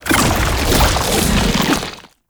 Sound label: Tank Infested Swipe Charge; Warframe; Lasrian Tank; Abilities